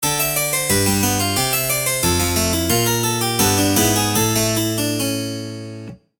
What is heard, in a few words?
jingle; maoudamashii